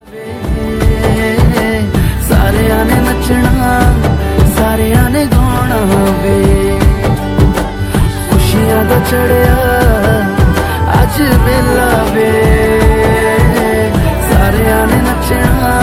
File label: bollywood ringtone